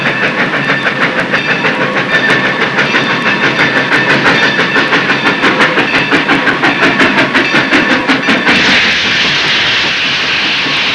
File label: TRN PULL; trasporti; treni